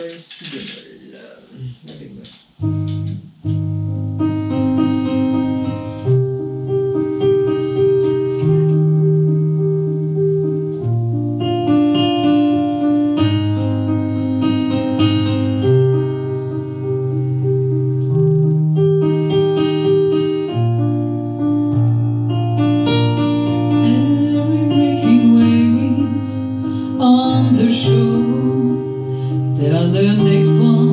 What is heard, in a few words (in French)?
Duo Piano Voix